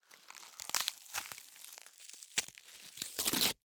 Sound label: Cardboard, honeycomb, crush, rip, tear; Eiravaein Works; Vaeyan IV